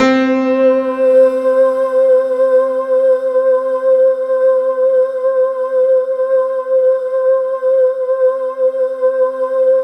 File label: C4, SynthLine; 90 s Sample CDs; NiceBeats; SynthLine VOL-22